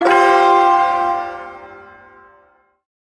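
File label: Shawzin Pentatonic Minor Chord; Pent Min Chord F; Warframe; Duviri Ersatz Shawzin; Chords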